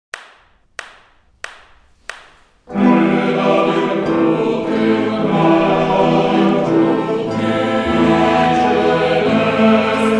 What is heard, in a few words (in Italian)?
coro